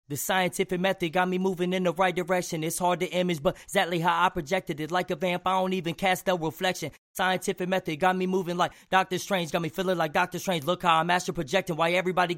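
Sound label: hip hop 138 F minor rapsing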